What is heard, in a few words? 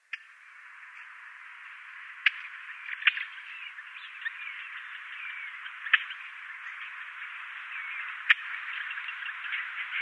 Clamorous Reed-Warbler calls